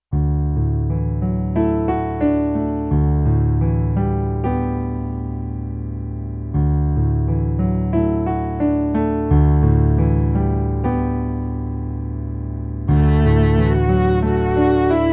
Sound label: viola